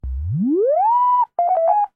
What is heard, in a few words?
Boot Up; jibo-cli; behavior-template